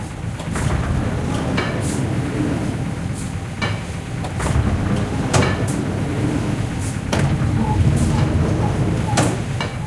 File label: factory, sfx tanks